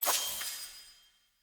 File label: Melee Perfect Strike, Swords; Weapons; Player